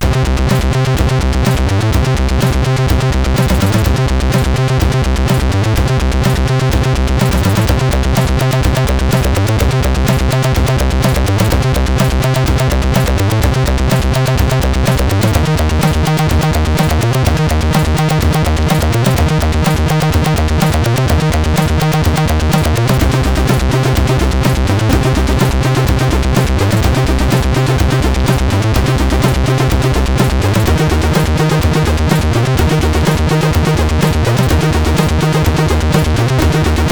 Intro Music, CSG8580R5; hvsc

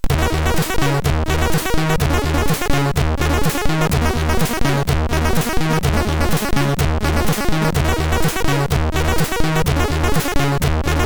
Game muzak; MOS6581R3; hvsc; Moon

